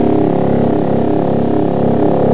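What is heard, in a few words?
hover car engine; BIGRED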